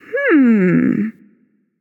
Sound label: NV Thinking; smite; awilix